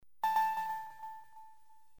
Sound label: Nintendo DS Boot, A Nintendo DS Being Turned On; Nintendo DS Boot Normal